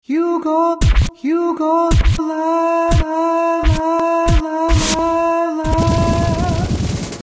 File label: scratch; Strong Bad's Cool Game for Attractive People